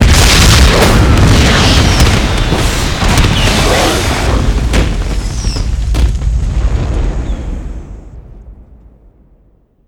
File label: explosion ammo dump; Explosions